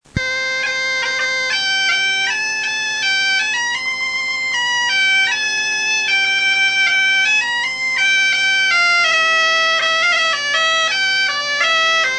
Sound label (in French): veuze